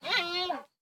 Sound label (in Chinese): 熊猫待机声